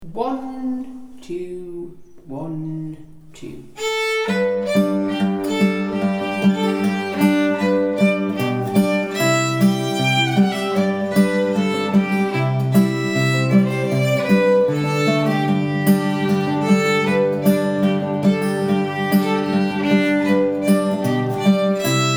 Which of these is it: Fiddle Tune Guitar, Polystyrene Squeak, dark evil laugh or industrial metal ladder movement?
Fiddle Tune Guitar